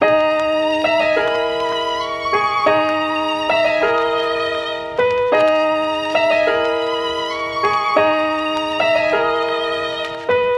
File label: Trap Phone 181 BPM Abm